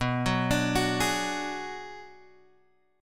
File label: B Minor 6th B, Guitar Open F; Bm6 arpeggiated